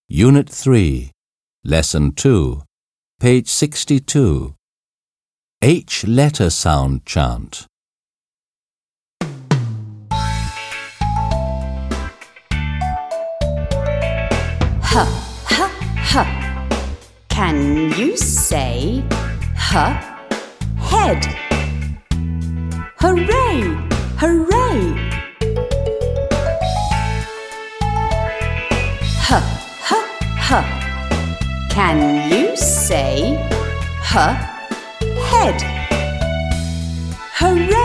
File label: H Letter Sound Chant